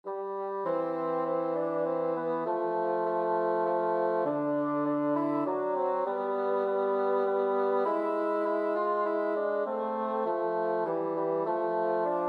bassoon duet

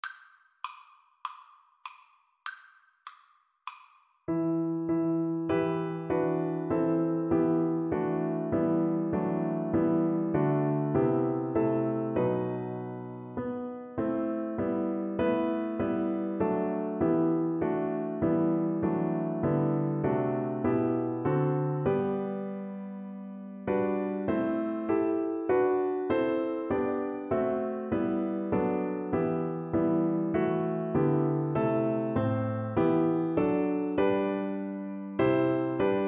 god rest, Tuba

